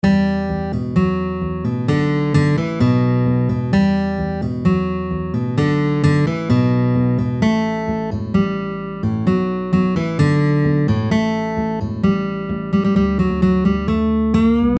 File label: riff